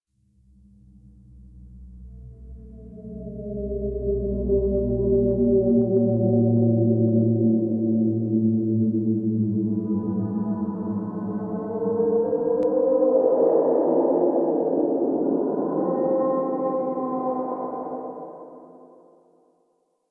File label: Exterior Eerie; Warframe; Duviri